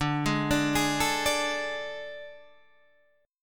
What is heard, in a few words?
A Major 7th Suspended 4th D